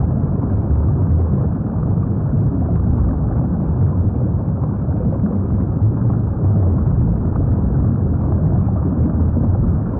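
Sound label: ambience loop; DAoC; Toa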